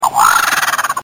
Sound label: Australian bird call